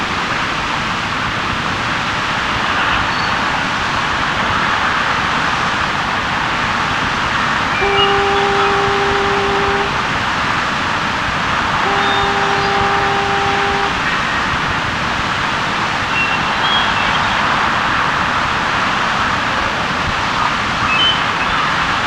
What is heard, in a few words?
Schooner Head Overlook